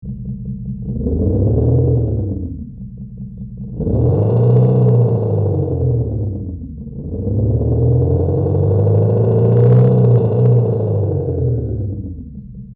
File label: panthera v8